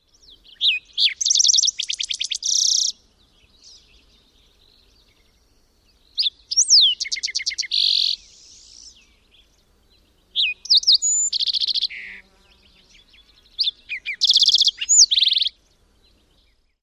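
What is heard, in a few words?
Green-tailed Towhee, songs number 2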